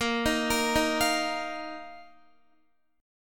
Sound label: A sharp Suspended 4th A sharp, Guitar; arpeggiated; JGuitar